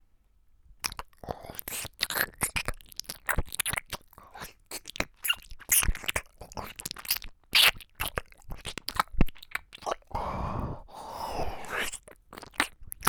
耳舐め; ドスケベクールなにわかコスプレイヤーの媚びっ媚び甘エッチ！

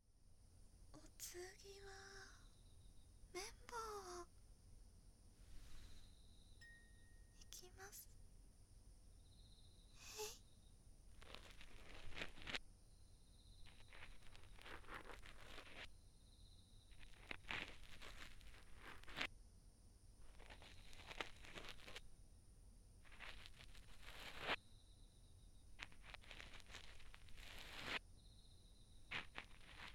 綿棒, ASMR; 左綿棒

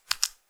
くちゅ音単発

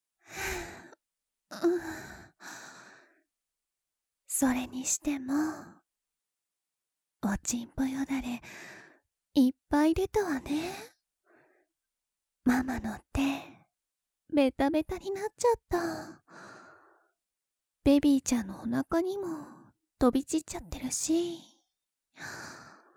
ペロペロ, ASMR

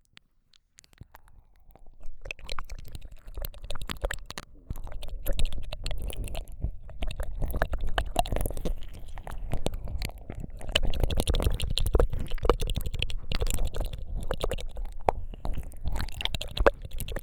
両耳舐め, ASMR

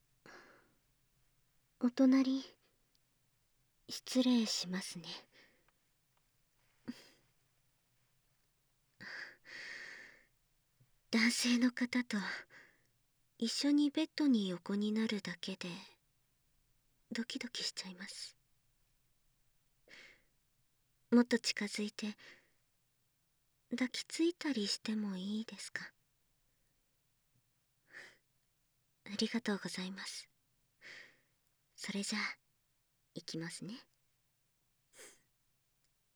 甘い吐息; SE音有

